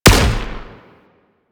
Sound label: Anti Aircraft Gun Shot B; Weapons; Warframe; Archwing